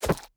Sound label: environment object grab bait